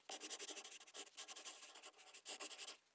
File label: Pencil; Sid Meier's Civilization 4 Complete; Beyond the Sword; Units